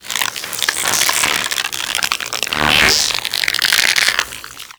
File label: Shock Swarm Projectile, Weapons; Warframe; Infested; Shock Swarm Rifle